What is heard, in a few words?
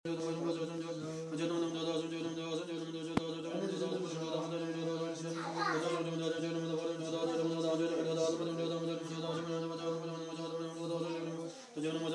Erdene Zuu Monastery Chants